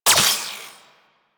Guandao Pistol Shot; Warframe; Tenno

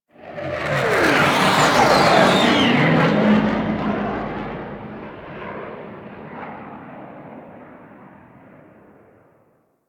Ship Fly By C; Sentient; Warframe; The New War; Plains Of Eidolon; Mission One

